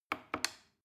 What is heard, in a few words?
plastic button small click regular slow